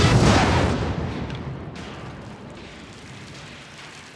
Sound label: explode; nippersounds